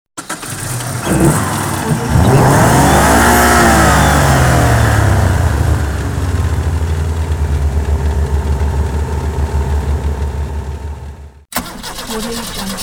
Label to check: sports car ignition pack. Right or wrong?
Right